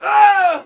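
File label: Settler Male Die Vox; Civ4 warlords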